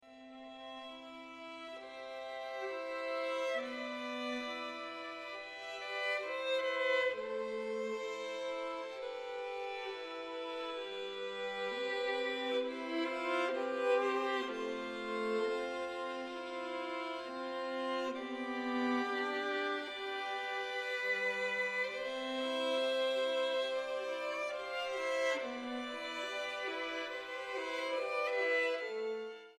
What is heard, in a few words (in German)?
Streichtrio in C-Dur; Liebe ist mein Schwert und Schild